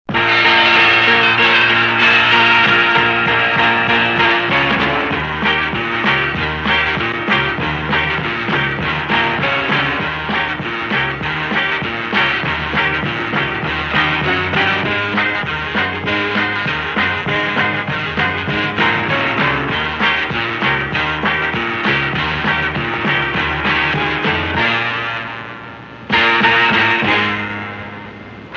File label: partial backing track